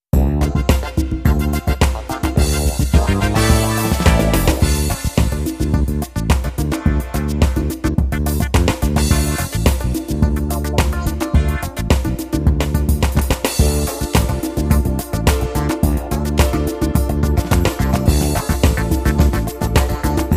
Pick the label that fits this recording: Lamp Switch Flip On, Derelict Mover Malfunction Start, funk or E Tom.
funk